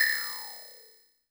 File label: percussion, Roland TD-20